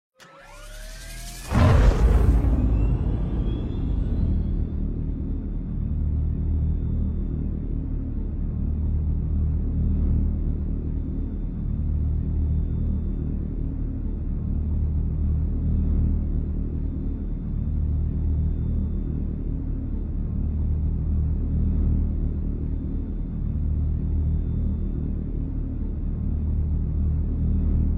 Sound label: Dodge Charger Daytona SRT Concept